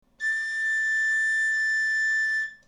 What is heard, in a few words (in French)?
do, galoubet; Note Do - 3ème interligne